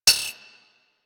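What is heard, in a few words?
machine gun mech; Warframe; Archwing; Weapons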